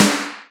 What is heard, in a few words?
Techno Snare, Alesis HR16B drum machine; 300 Drum Machines